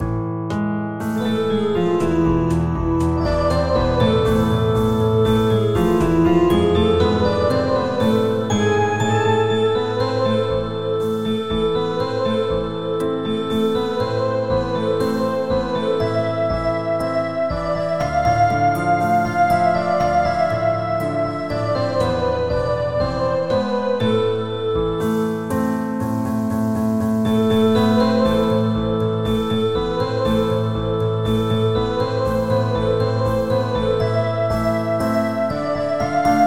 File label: keyboard